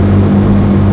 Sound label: rev